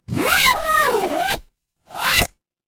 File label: paper fabric zipper